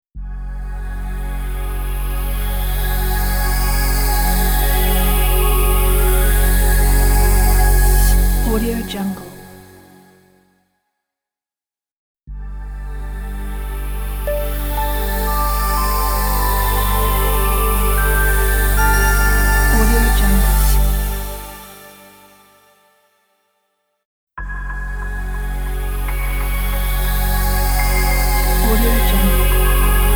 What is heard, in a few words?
innovative ambient logo pack